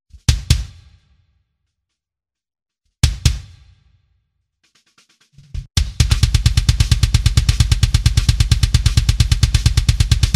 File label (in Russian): живая бочка с легким добавлением пробивного бочечного сэмпла для получения большей атаки; На втором плане